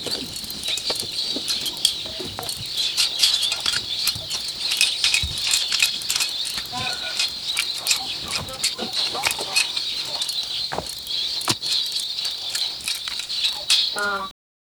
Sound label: sound of wooden pestles; background chatter